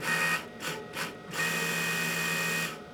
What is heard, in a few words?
tech oneshot; ambient; Counter-Strike Global Offensive; office